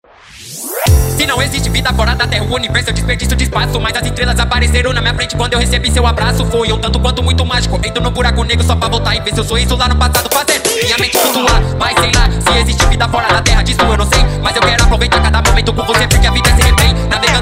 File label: TECNO FUNK